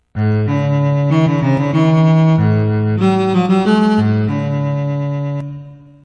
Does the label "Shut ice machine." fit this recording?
No